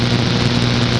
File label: Electric Fritz; A-10 Attack! Cuba Demo